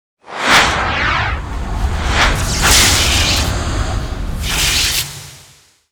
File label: air splinter